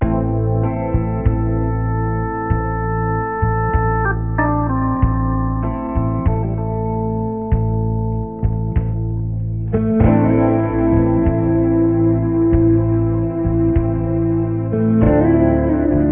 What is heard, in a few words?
hold music